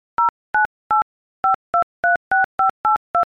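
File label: iphone keyboard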